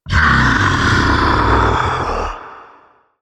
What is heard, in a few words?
ogre